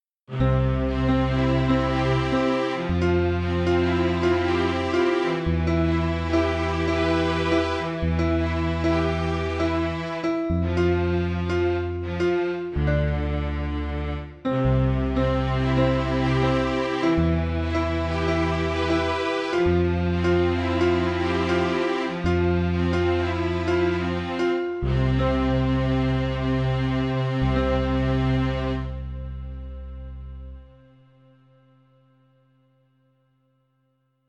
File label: Celesta